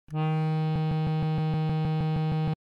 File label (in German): bad loop